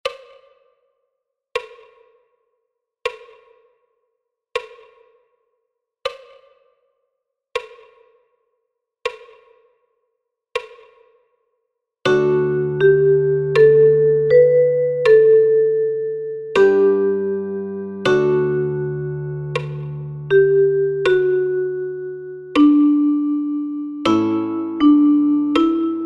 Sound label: Gavotte